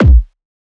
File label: kick multi; Drum Sample Pack; One Shots; Slate; SEISMIC